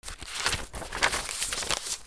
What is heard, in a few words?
PAPER